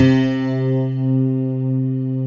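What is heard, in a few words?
grand piano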